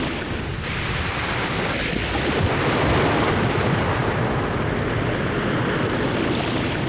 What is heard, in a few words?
water land; Pirates Of The Caribbean